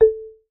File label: Marimba, notifications; Oppo Find X5